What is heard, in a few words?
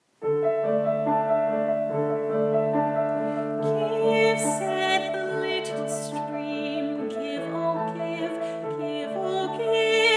Give Said the Little Stream, vocal